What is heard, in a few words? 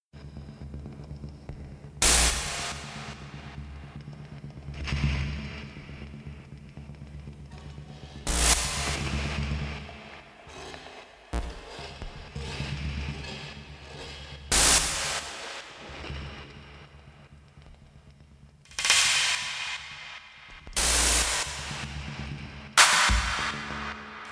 Start Drone